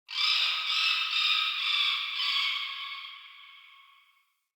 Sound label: Swamp Tropical Bird; Ambience; Midrath Island